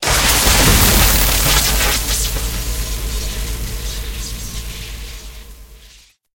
super Mega Win Text Change; wildotron 3000

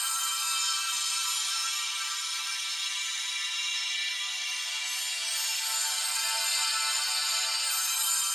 shimmer loop; santa vs rudolf

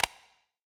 quick Stop, slots; king of slots

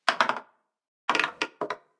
ball bounce, roulette

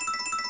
win Banner Count Up, slots; king of slots

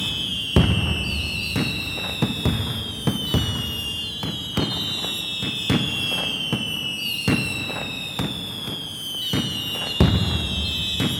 big Win Fireworks; imperialriches

